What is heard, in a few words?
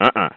uh uh; 3dcity